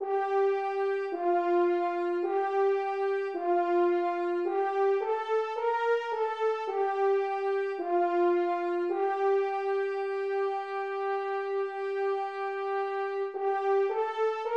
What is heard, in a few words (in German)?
sopran